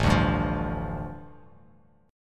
A 7th Suspended 4th Sharp 5th, A, down, Bass Standard; A7sus4#5 strummed